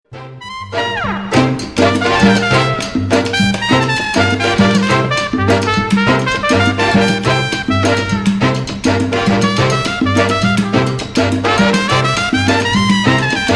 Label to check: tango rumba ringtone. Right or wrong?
Right